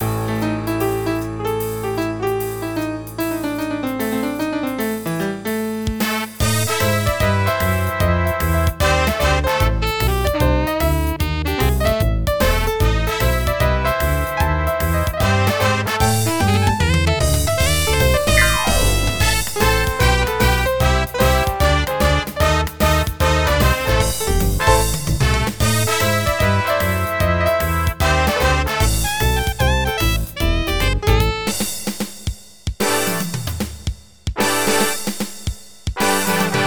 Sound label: Jazz; Milk Chocolate